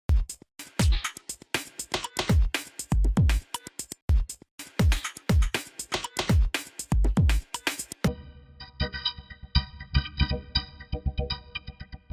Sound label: Vocoder Arturia